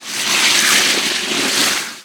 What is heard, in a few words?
corrosive skin; viper